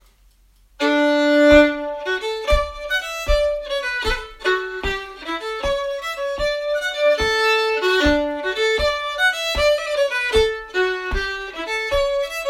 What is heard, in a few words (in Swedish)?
schottis